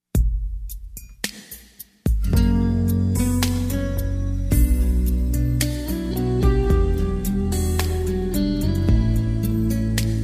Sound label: brass minus